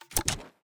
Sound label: submenu dig open